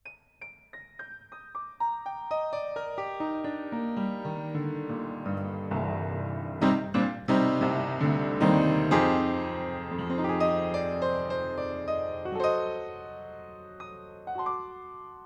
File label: Piano, Panels Off 20cm, Spaced Omnis 80cm Soundboard